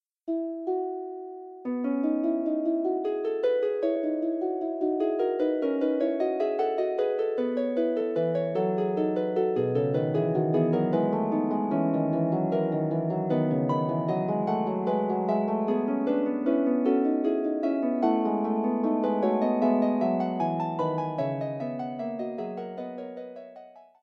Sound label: Fugue, Harp